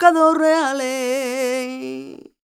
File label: voc c, Flamenco, Vocals, Female Cantaora; ProSamples vol.46